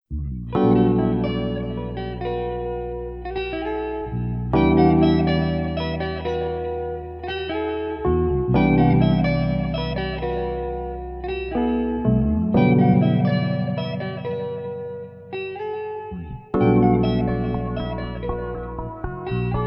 guitar chill 432 hz; go with me

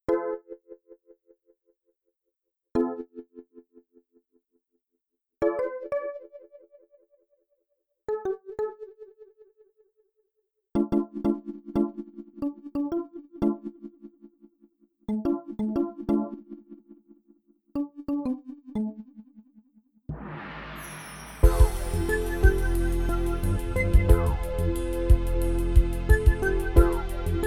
fitness music for stretching 432 Hz

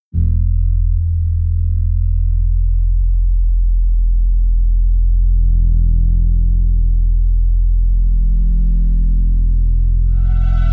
deep bass meditation 432 hz

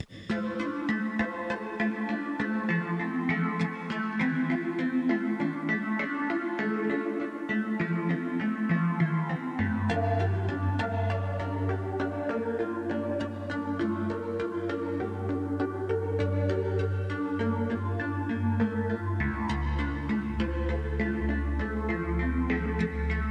ambient meditation AMBIENT RHYTHM

